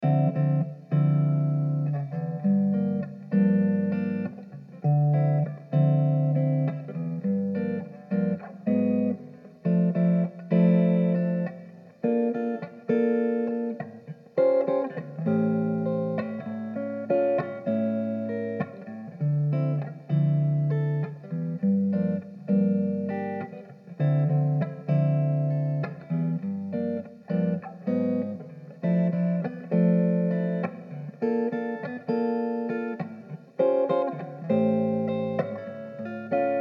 Dm100 Jazzy GTR chords

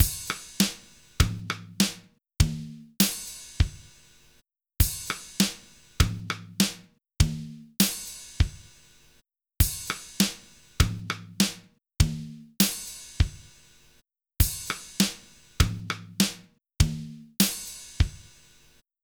drum loop 100bpm

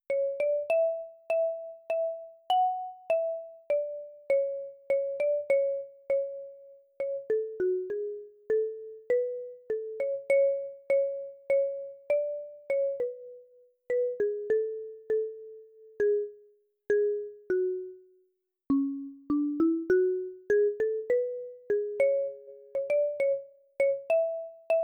marimba A 100bpm

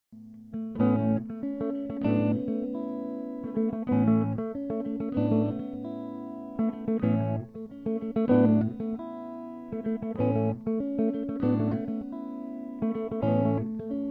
A96 GTR motif in 5/4

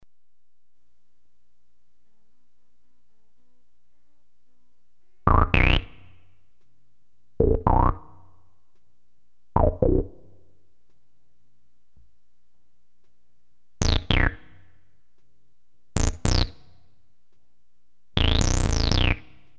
piano

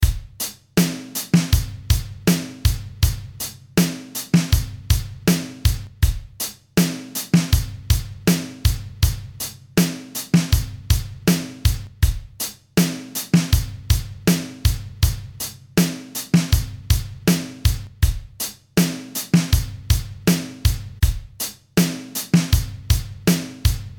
Blues Riff A 80 BPM (Nylon)

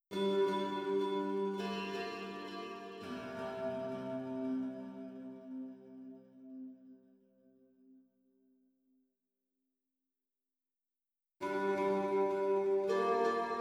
Rolling Strings, Ambient Sample Pack; Wiseman

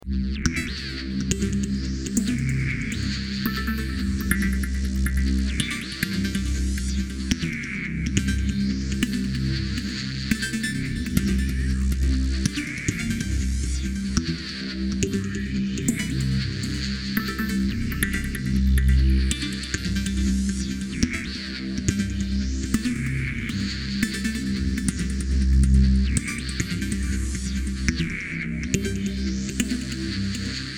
C140 sequence; Polaris

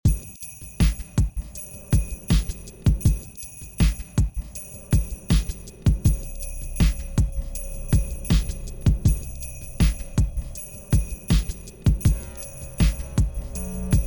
Jingle Bells

